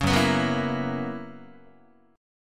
F sharp 11th F sharp Guitar; F#11; 4,2,2,4,2,3; strummed

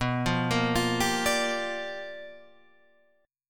G Minor 7th, B; Gm7/B arpeggiated; JGuitar

